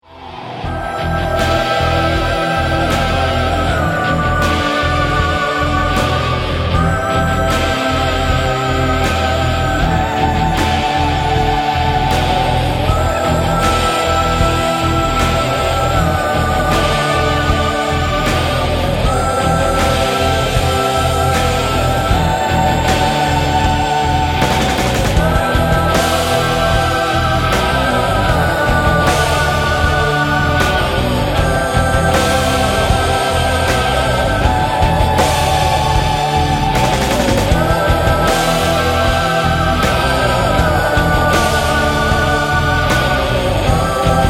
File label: Heavy metal